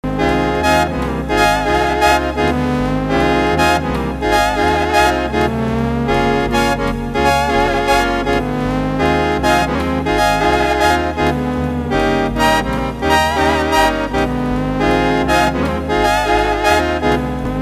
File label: Swing In Ab Mono; wind sounds